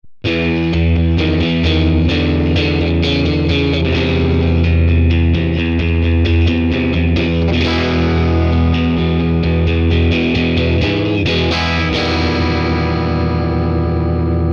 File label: Warm Clean and Controlled; Tone King Imperial MKII